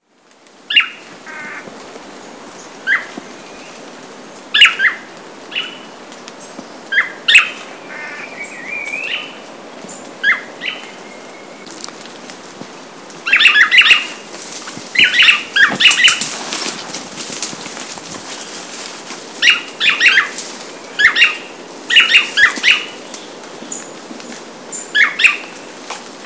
Chiroxiphia caudata dance; N Cunha

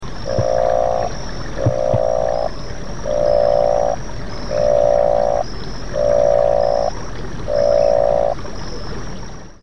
Proceratophrys boiei; PN Itatiaia; A Silveira

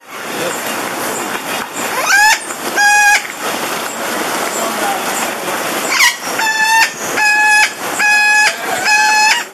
Amazona leucocephala caymanensis; Botanic Gd; Gr Cayman Isl

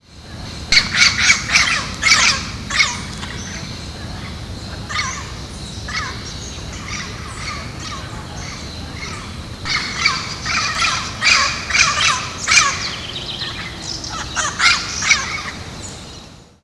Amazona aestiva; Butantan Sp; Silveira

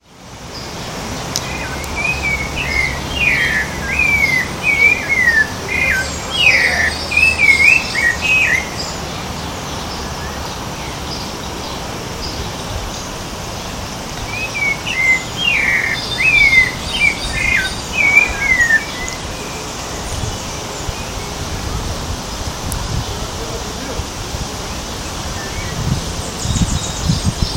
Turdus rufiventris; Pq Moinhos de Vento POA; Silveira

